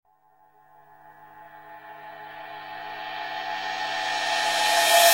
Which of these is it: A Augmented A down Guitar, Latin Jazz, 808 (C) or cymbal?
cymbal